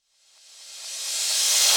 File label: Big EDM Drum Loop Rev Fx, 128 BPM; Future House; Future House Tools